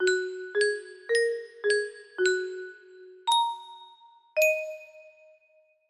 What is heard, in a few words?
music box melody